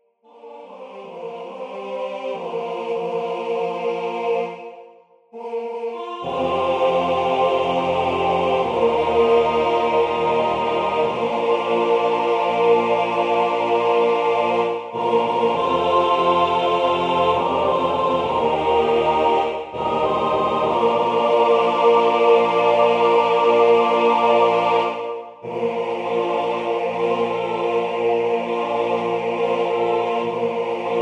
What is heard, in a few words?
MIDI, SSAATTTBB a cappella